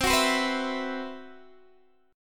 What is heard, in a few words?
C Major 7th Flat 5th C, Guitar DADGAD